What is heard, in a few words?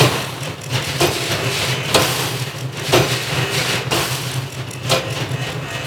Heater Vent Loop; Props; Loops; Warframe; Venus Open World; Venus Landscape